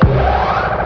plunger

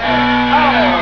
time up

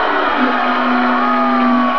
outta time

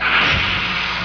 woosh

